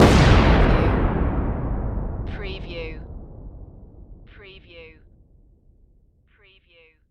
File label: Explosion Trail Off; Sound Effects Bible